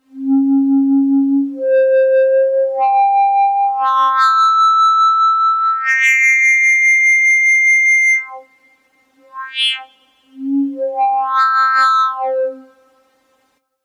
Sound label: reed harmonics